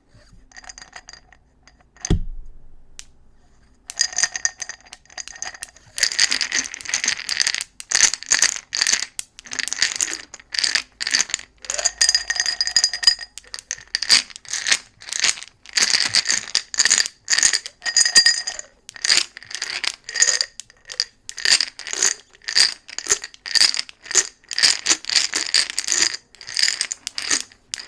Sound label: Gravel & Glass